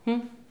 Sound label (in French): hum